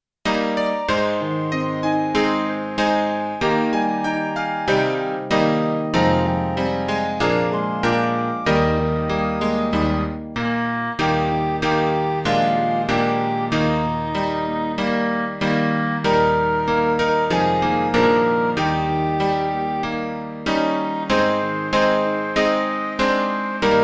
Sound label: when christmas morn is dawning midi